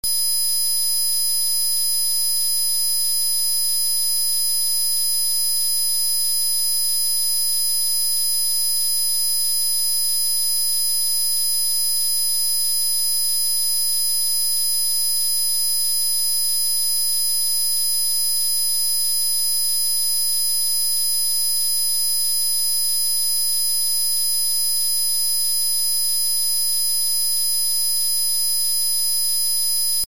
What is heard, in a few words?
5khz